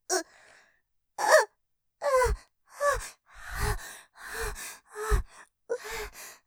絶頂余韻 はぁ… はぁ… はぁ 声を震わせて; 目がハート; ループで楽しむ！シェフの気まぐれ抜き抜きコース

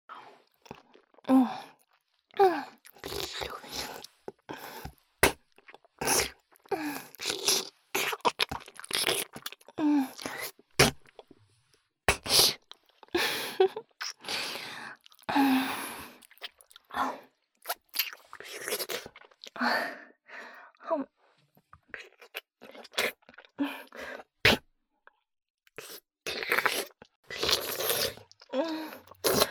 ツバ吐きループ, ASMR

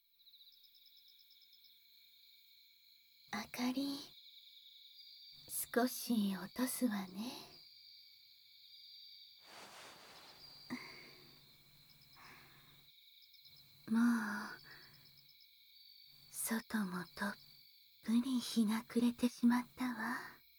心地よい耳かき, ASMR

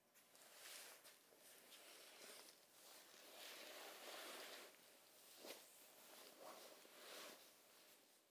衣擦れ左から正面へ

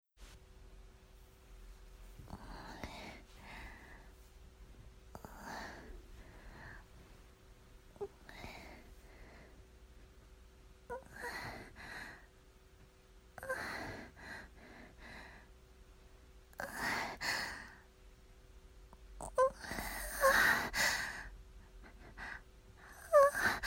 喘ぎ声と逝き声; 21歳

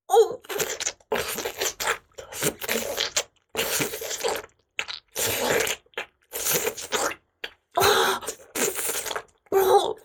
フェラチオ(強):頭を前後に振って深く陰茎をしゃぶる, チュパ音

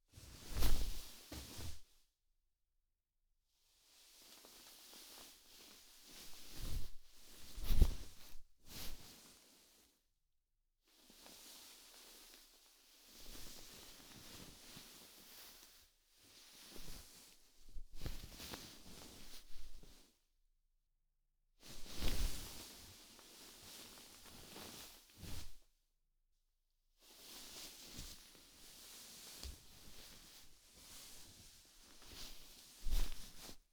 布団を捲る→かける; 3DIO; 布団の音

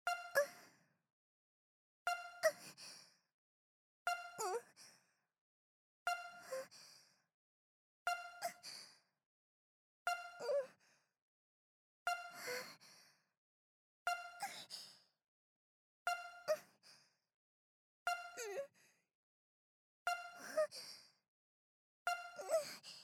ゆっくり

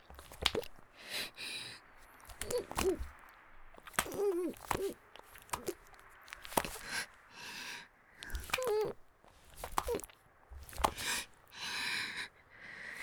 (木枯らしバージョン) ギシアンループ; 田舎の別の無感情少女と自己犠牲愛

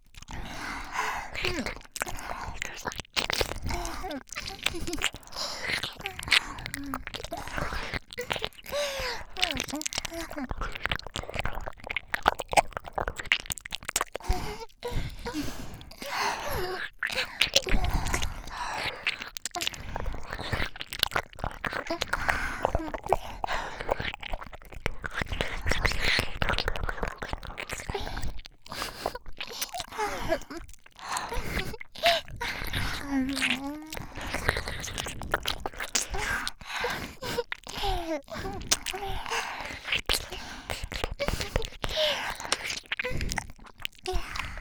耳舐め 甘

